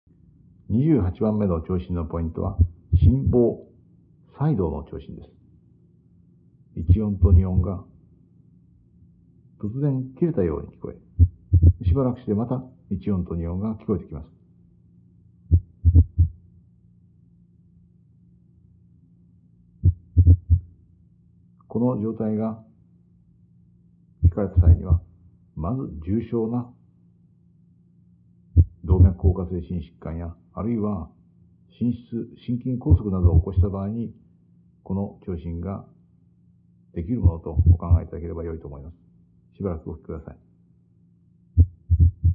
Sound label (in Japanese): AF 心房細動